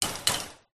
metal pole hollow small hit strike metal fence chicken wire